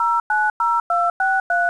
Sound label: tones